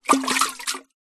liquid water in metal canister short movement splosh splash